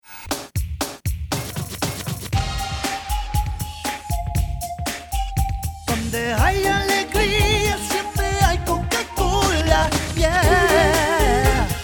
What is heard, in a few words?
Jingle; CocaCola